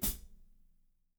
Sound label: HAT25-R, DRY KIT; Spectrasonics; Burning Grooves